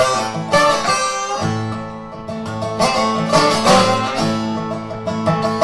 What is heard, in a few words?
dobro